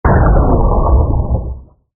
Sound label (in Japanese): 毒魔法; モノラル; 魔法効果音